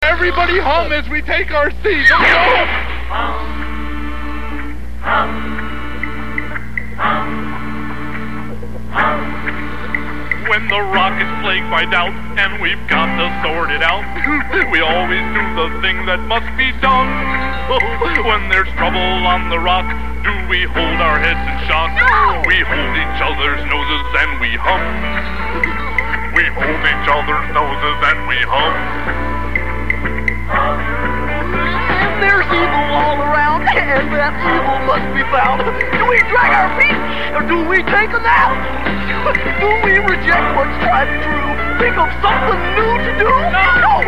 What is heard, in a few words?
hum & dance & clap & sing; fraggle